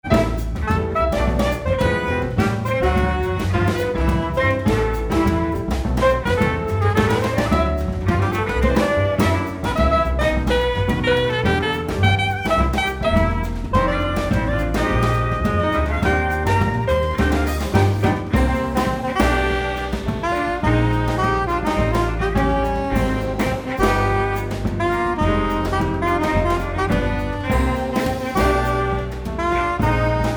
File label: Bossa